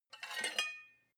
cutlery take from plate slide, gastronomie; berufe; soundpool

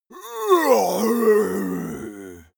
monster growl aggressive; kreaturen

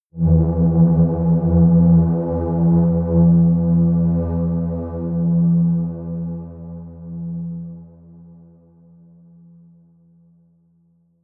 drone bass drone short; musikelemente

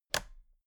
toolbox latches close regular

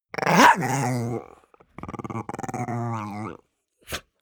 chihuahua growl bark; natur-tiere; hunde

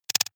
noise clicks; maschinen; signale; soundpool

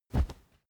whoosh cloth soft dry; swooshes-swishes

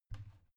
bathroom cabinet creak open heavy; objekte; tueren

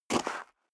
step high heels gravel light single left; schritte; Footsteps; menschen

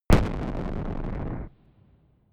gun pop gun shot distorted

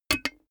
cash box metal lid close; maschinen; soundpool

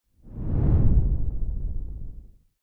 whoosh simple low grainy; swooshes-swishes

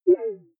query smooth wobble, signale

